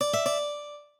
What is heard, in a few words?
lute